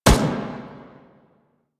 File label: Turret First Shot; Weapons; APC; Warframe; Lasrian